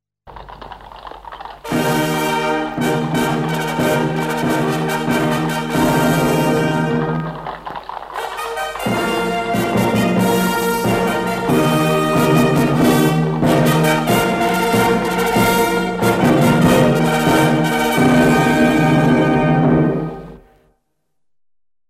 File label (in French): Marche Grenadiers a cheval; Napoleon; hymnes Napoleon